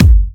Electro Kicks

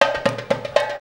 PERC, Gipsy; Best Service; Ethnic Journey Sound Cube

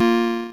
synth, amiga med

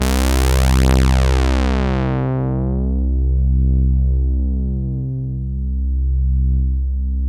SYN EUROSY; AMG - Now CD-ROM (Roland); Megabass 1

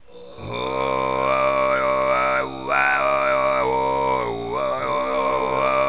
TV FREE, Vocal World Voices TUVAN LOW

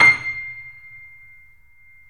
3D Pianos Yamaha C7; E-MU Producer Series Vol. 5

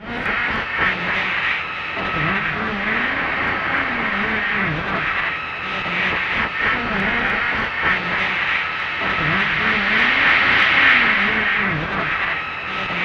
Interference E3; Zero-G - Ghost In The Machine

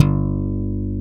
POP G1, BASS; AKAI S-Series CD-ROM Sound Library VOL-2; MX.BASS

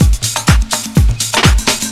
cocktail, Deep House 125bpm

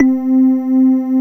VELIMBA; MORPHEUS

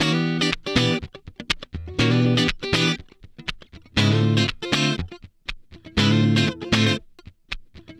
Guitar Licks

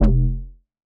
Bass F, Bass Synth

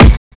kick, Drum and Bass; EZ Rollers; Kick Set 3; Instrument Samples

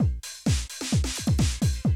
130, Classic DrumLoops

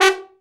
BRA TROMB, Trombone; Sound & Vision - Gigapack II CD 1

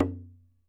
DAR MUTE, DAF DARABUKA; AKAI S6000 CD-ROM; WorldSounds

